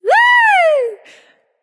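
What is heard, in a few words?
yay, female; smite; voicepack; hera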